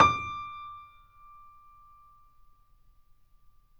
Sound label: Mf D5, Grand Piano; AKAI S6000 CD-ROM - Volume 2; Grand Piano 256M